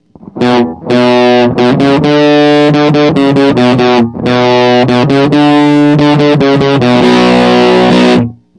fuzz

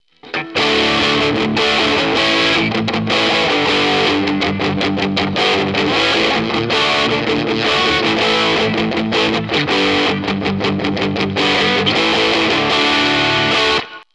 amp jtm45

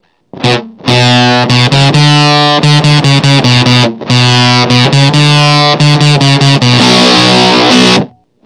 oct fuzz

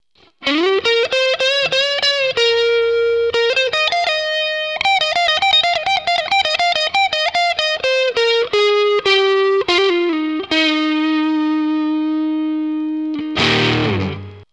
amp jtm45

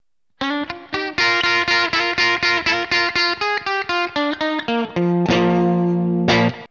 amp ac30

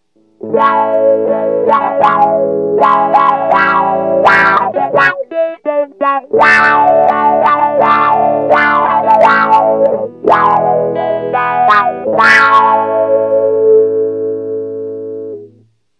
auto wah

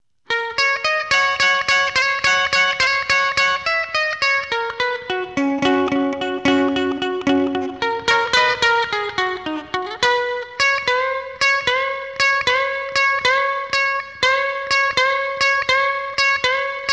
amp bassman

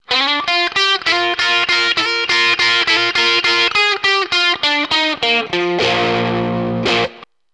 amp jtm45

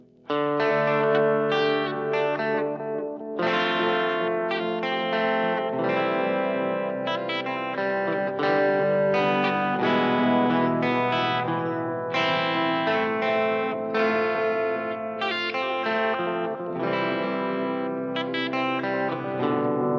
hr delay

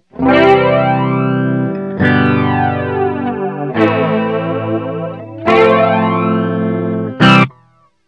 flange

